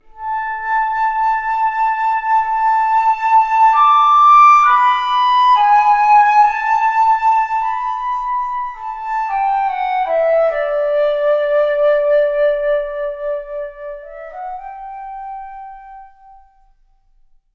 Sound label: Flute